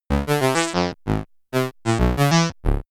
musical level

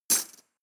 cutlery teaspoon drawer place hard

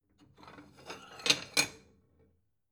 plate small take slide soft

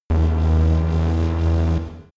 space buzzing machine

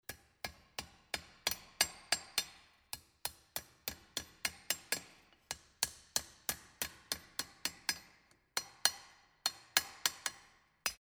hammering

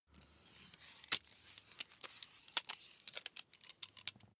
meter unfold slide sequence

